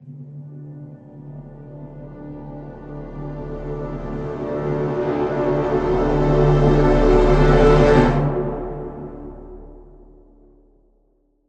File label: Epic Tape Orchestra Cres